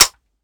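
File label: akilo47 fire first player, weapons; viper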